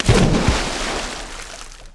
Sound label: Splash; Worms3D